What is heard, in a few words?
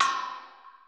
cymbal, Roland MC-909